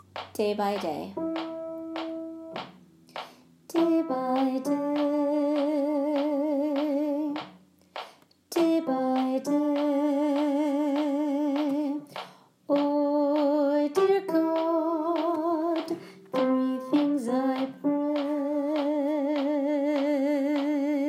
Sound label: Day By Day Melody; Broadway Shabbat